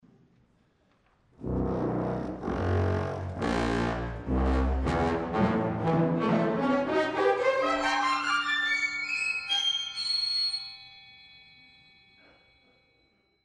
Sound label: bass trombone; Richard Ayres No 42 In the Alps Act II Scene 5